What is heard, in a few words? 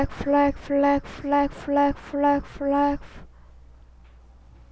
laugh